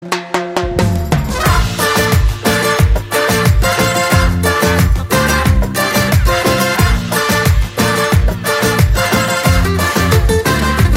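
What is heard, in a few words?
background music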